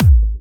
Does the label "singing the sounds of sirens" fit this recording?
No